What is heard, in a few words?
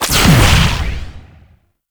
Amp Charged Shot; Warframe; Weapons; Operators; Amplifiers; Op Drifter Amp